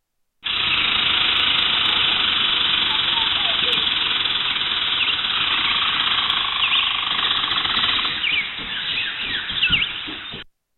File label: squirrel in forest